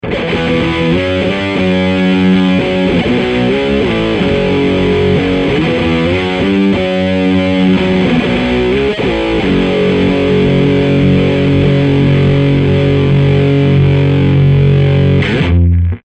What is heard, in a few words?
distortion; Dr Boogey